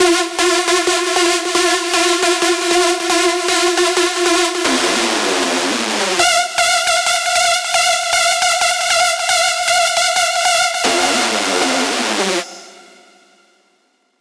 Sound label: screech loop F min 155; Music Loops; GhostHack